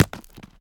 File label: Scrat stone land; Ice Age Scrat's Nutty Adventure